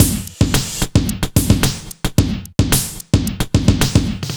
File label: BPM110 FX; Effect Loop